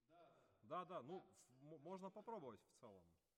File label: Tenor, RNR for piano